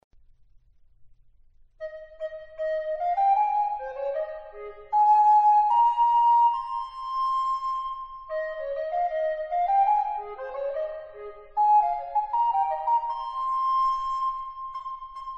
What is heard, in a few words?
courante; van eyck; mars